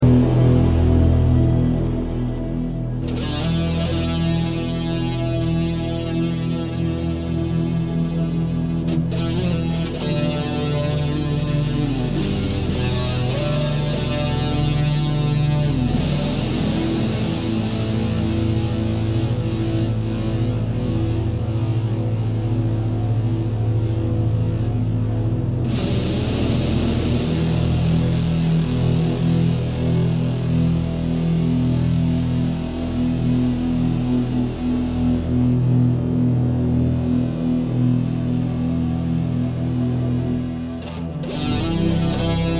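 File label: intro Rams Head Muff Bassman; Reevs Eclp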